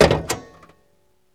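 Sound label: TRUNK CLOSE, Car; Transportation; VSI Car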